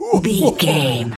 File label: voice fun man character deep laugh